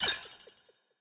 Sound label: UI Boss Shield Broken; Conquest; blue archive